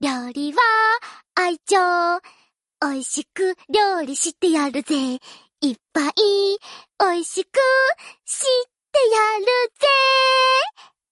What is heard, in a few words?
singing; lyria; lyria cooking